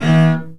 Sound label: short strum, cello